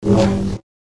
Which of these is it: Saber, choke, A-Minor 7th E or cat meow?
Saber